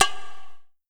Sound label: PERC